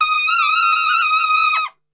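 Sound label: D# trumpet shake; Best Service; Brass Super Section; TRP SHAKE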